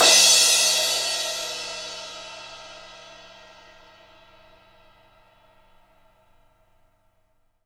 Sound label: CRASH, Percussion; MARKYDRUMS